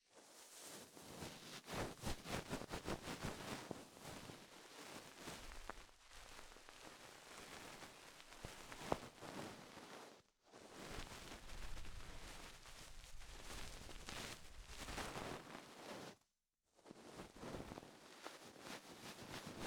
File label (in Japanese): 梵天 右